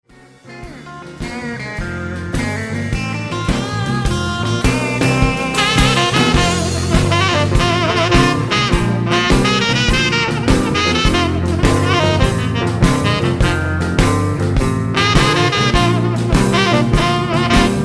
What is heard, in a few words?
honky tonk